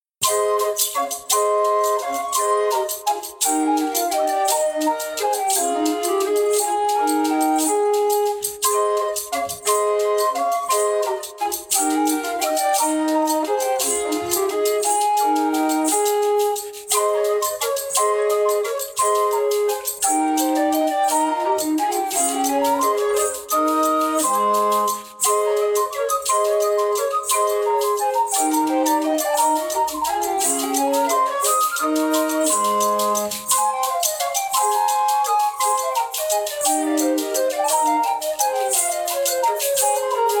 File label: Pavana; Luis Milan; Pavana 6